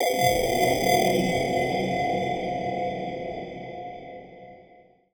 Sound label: TECHNO FX 140